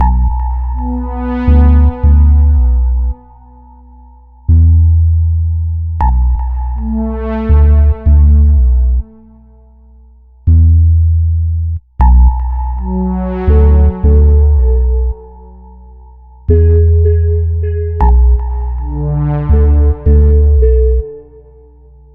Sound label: stem outro; Pix the Cat